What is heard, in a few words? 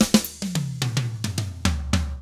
SMBA, SAMBA; ILIO; Double Platinum Drums 1